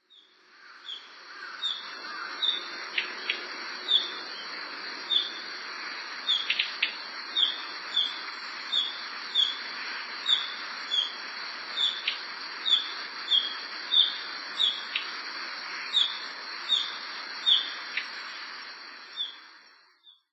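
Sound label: Narcissus Flycatcher; kibitaki; utsunomiya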